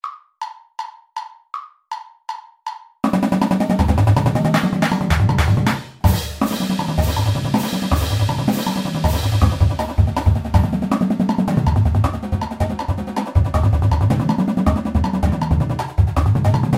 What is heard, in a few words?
paradiddles click